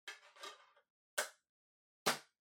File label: Portable CD Far Put In Close